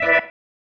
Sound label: Stab One Shots, Organ